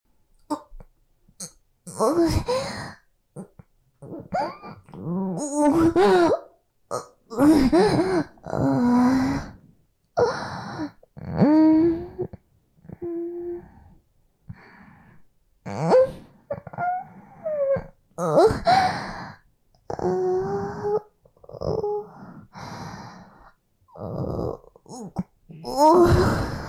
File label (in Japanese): オホ声